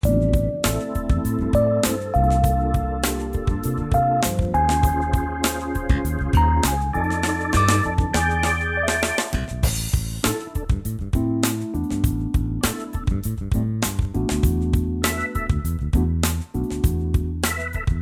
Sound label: Am No Brass